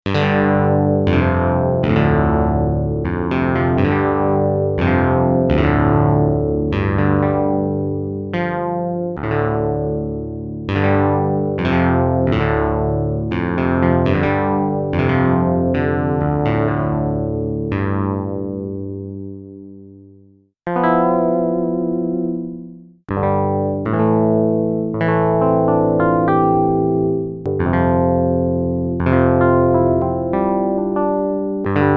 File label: minimoog; creamware; katzendarm